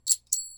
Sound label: ka-ching; OpenFrontIO